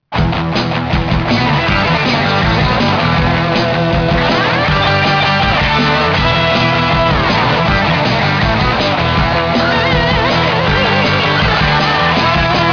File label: heavy